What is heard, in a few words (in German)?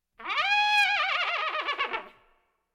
Instrumente Trompete Wiehernd